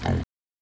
Wild boar Pos

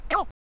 Ibis Neg threat

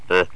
Primate Grunt

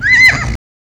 Przewalski s Pos High

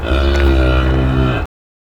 Cattle Neg High

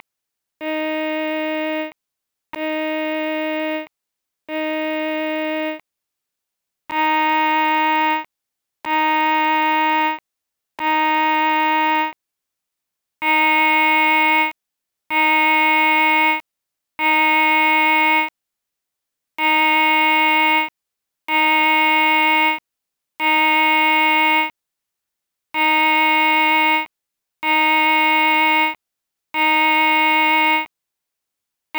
Synt neutral twang

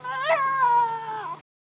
Aggr Fox Neg Low

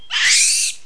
Primate Scream

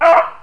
Primate Bark

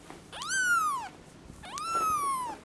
Kitten Neg Low Isolation

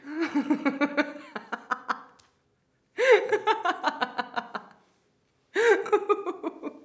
laughter phrases

